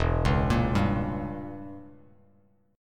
E Diminished 7th E, Bass Standard; Edim7 arpeggiated; JGuitar

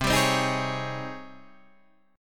G sharp Major 11th C

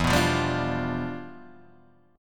E Minor 7th Flat 9th; Em7b9; strummed; JGuitar

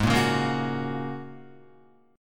Ab 7th Suspended 2nd Suspended 4th down Guitar; strummed; 4F,4A,5C,5G,5C,6E

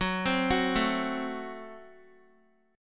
F sharp Diminished Guitar; F#dim arpeggiated

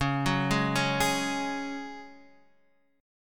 Db Suspended 4th, Guitar, Half Step Down

